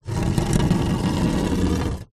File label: foley insulation tile drag ground; zapsplat